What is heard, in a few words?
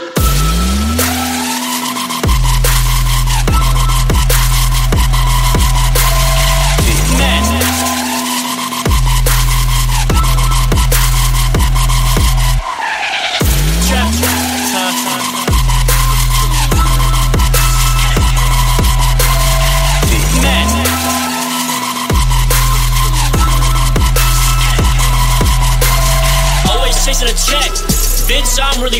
Drill